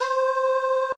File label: choir music